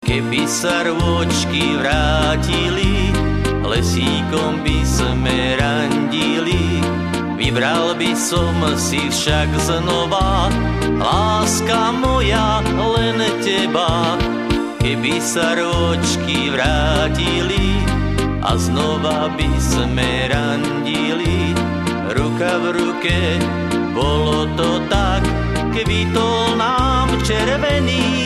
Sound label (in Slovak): akord